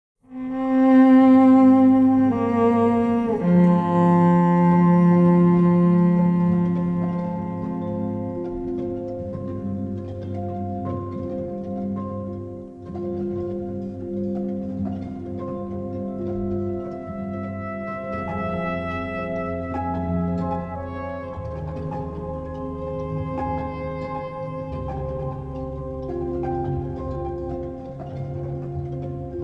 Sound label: violin jewish; Muzyka Ilustracyjna